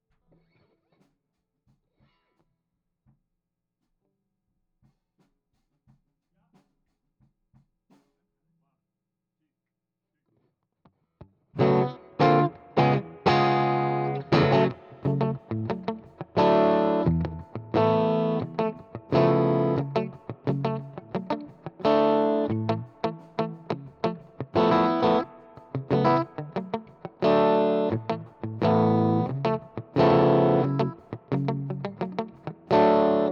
GTR R84

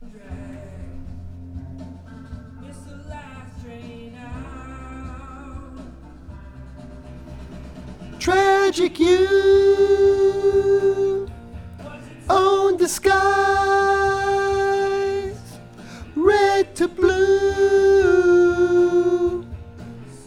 VOX Back

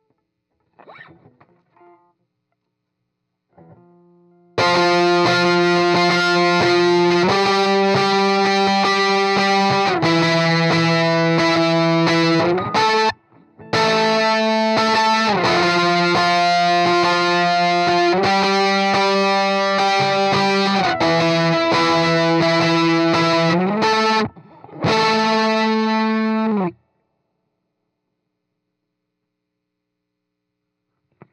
Heavy GTR

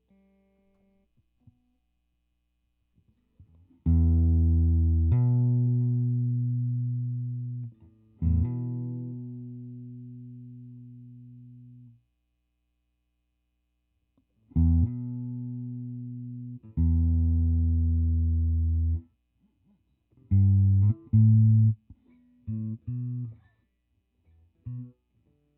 GTR R84

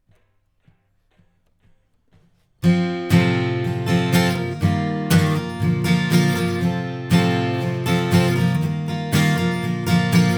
Ac GTR

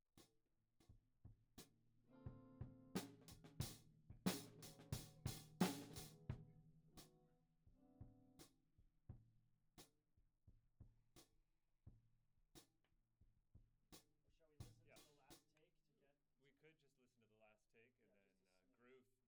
Tom1

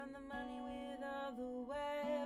Act Guit L

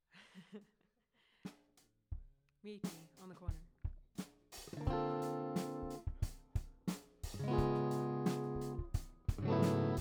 Rough Mix L

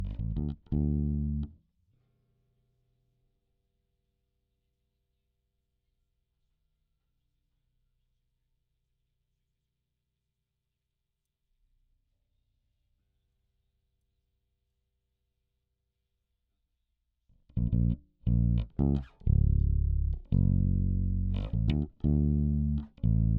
Bass DI

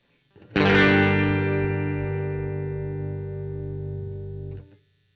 GTR OD

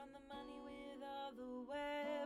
Act Guit R

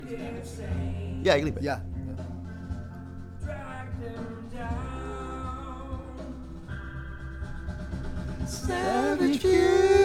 VOX Back

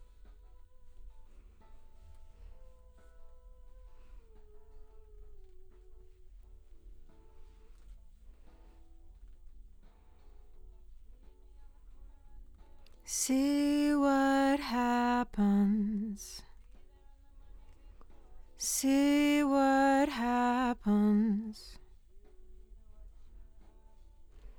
LdVOX Double

